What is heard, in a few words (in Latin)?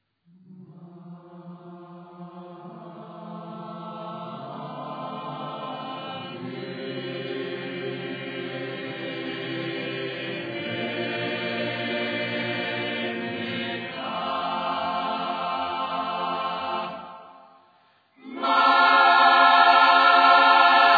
Magnificat